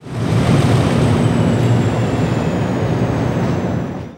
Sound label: rundown, Train; Y7100; OpenBVE; essai wagon